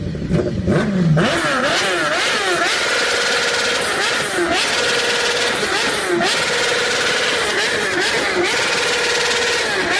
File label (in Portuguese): cortando giro